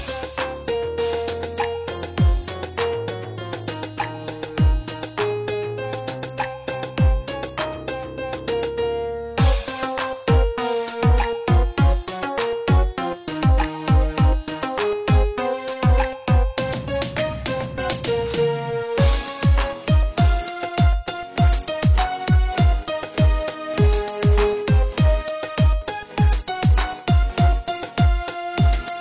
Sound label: instrumental ringtone; bollywood